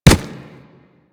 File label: Kubrow Run Dirt, Footsteps; Enemies; Warframe